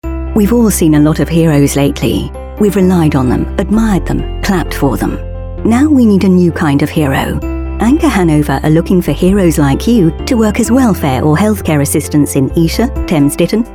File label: hero music